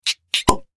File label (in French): Tire Bouchon